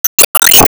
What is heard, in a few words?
Enemy Poisonwizard Mirror Crash; gamerip